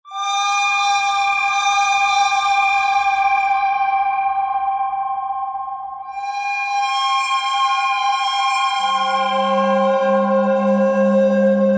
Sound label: bird thread simple